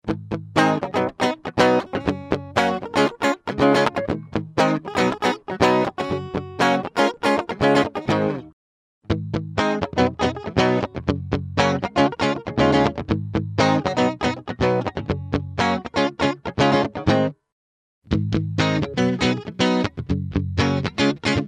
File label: Hot Line Sp Sim clean